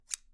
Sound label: Scissors Shears; Scissors Kit